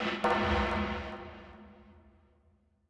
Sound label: FX Industrial 125